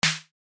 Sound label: death; quatuor